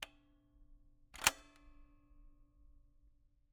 Camera, Kodak EK2 Instant, polaroid, large, plastic, springs, release; Eiravaein Works; Vaeyan IV